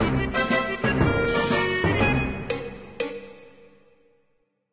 Gag; MapleShrine; mkxp; gameasync

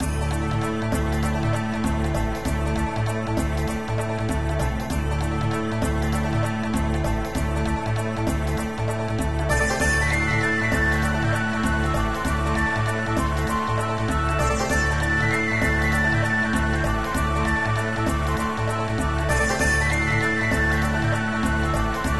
Town; MapleShrine; mkxp; gameasync

